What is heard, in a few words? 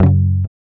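GUI F#, acoustic guitar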